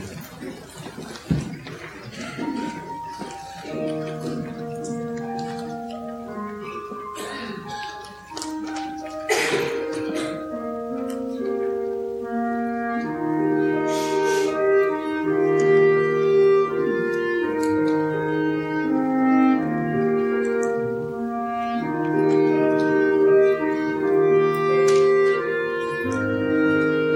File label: clarinet